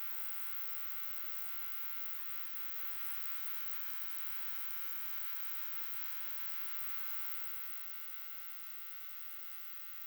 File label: Amyna natalis 15kHz HP